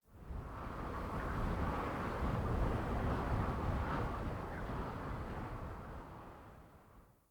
Fort Wind Gust Soft Buffet Airy; Ambience

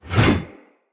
One Handed Axe Elite Whoosh Heavy; Weapons; Enemy; Axes; Sinecure One Handed Axe Elite

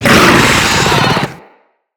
Infested Ancient Attack Grunt; Enemies

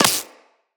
Laser Impact Flesh; Weapons; Bullet Impacts

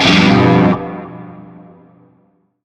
Shawzin Maj Chord J; Music; Warframe; Narmer

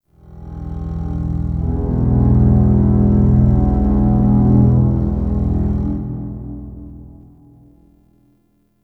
Flower Structure Drone; Tau; Flower Farm

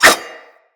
Dagger Whoosh; Weapons; Dual Daggers

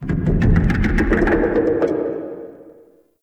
Distant Clicking; Warframe; Duviri Murmur; Ambience

